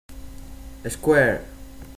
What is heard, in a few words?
square, spain